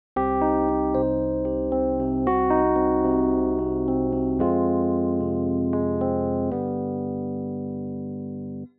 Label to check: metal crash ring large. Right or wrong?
Wrong